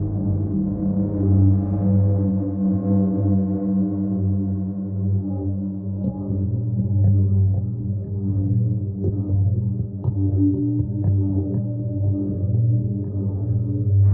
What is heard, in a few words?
Pulsing Ambience LOOP; unrest indigo